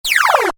Minimise, Effect To Accompany Minimizing Of Window Or Program; Transitions; Multimedia